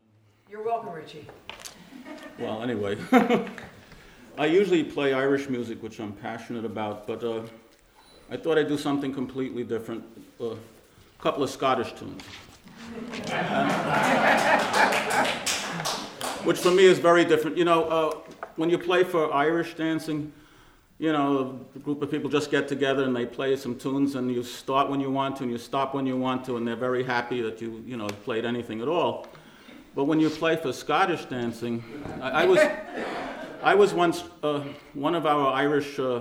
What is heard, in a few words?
Scottish Patter; 2013 Concert